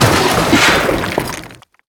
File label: objects, World of Tanks